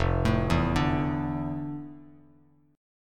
F Major 13th Bass Standard; arpeggiated; JGuitar

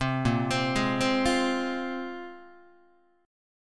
A sharp 7th Suspended 2nd, Guitar; arpeggiated; JGuitar

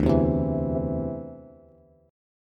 D 7th Suspended 2nd down Bass; D7sus2 strummed

